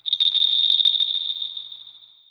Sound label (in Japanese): suzu no oto; 鈴チリリン; コロロンとなる鈴 猫の鈴？